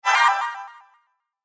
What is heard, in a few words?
CHIME SHIMMER WARBLE; Blastwave